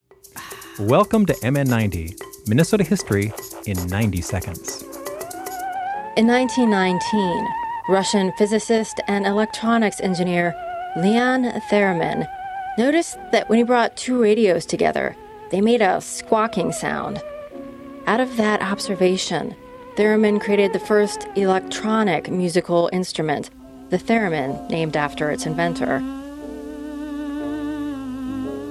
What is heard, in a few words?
Theremin